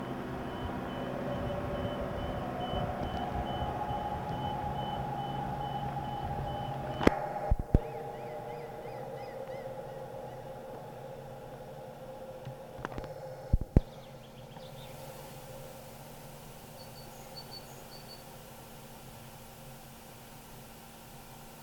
three birds

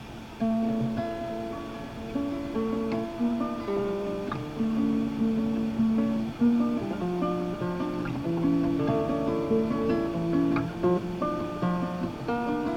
guitar outside cooking

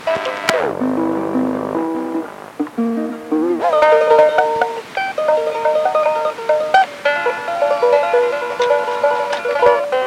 speed guitar

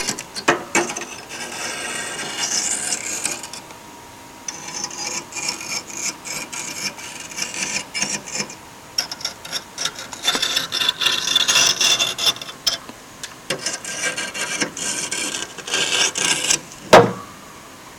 scrape

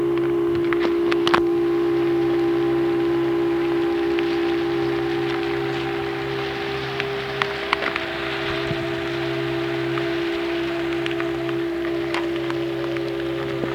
water stuff

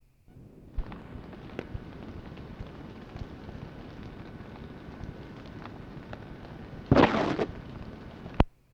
attack

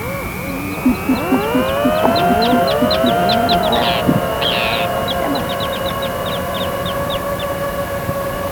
birds

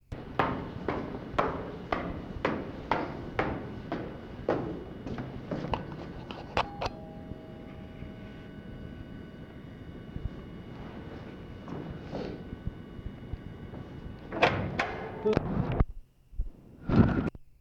bell, tapes

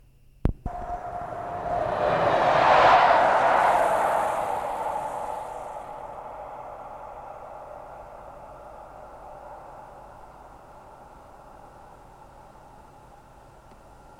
train

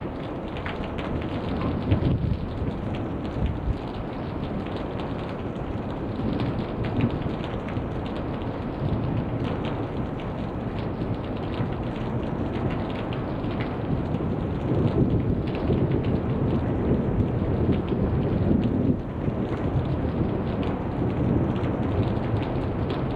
rain

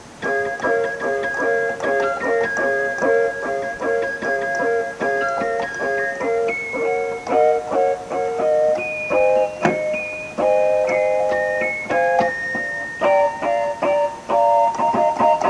color pulse

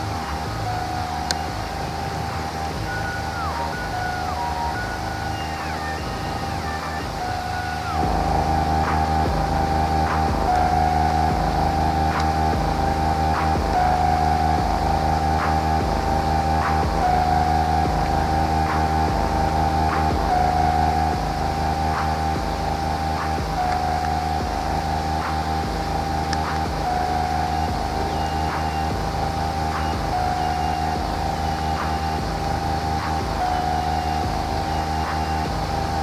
drum clappie

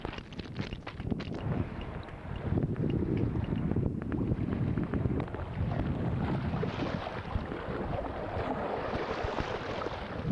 the waves

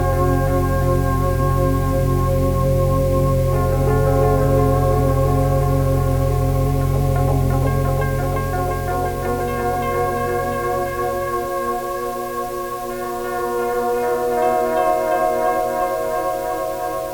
nattstrums

